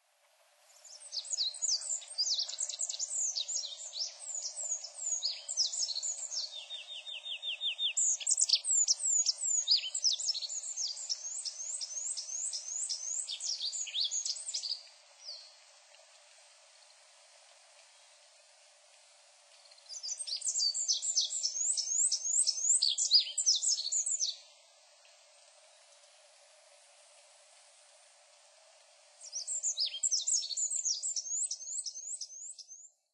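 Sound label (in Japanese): binzui, olive-backed pipit; asyoro; koe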